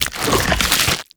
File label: open slow, zombie blind jaws; Half Life Alyx; creatures